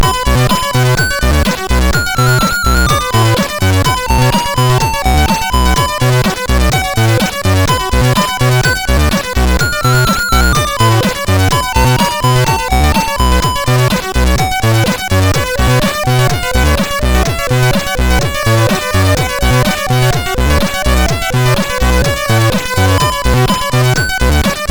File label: Bell Test, MOS6581R3; hvsc